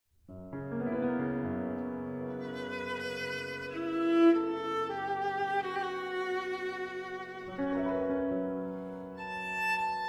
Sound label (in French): Quintete; Choveaux